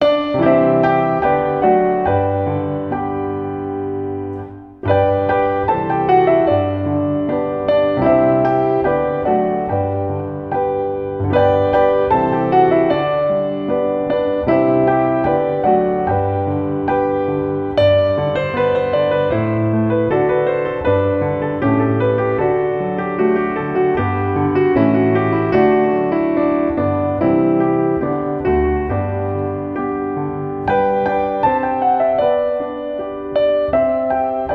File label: Ding Dong Merrily On High Instrumental